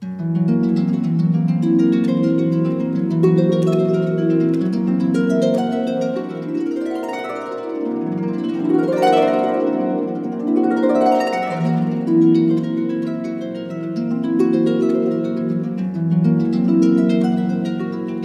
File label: Elf Harp; World of Warcraft; Legion